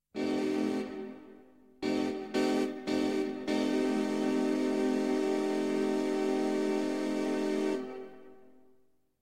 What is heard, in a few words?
Jingle